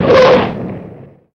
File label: Car Skid Dirt Amb; skids autos